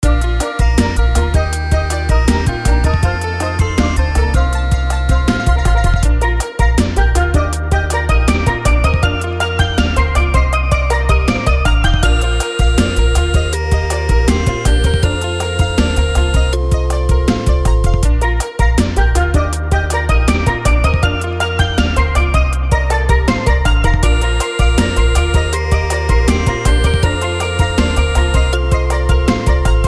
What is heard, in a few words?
Beach Level Loop; beach music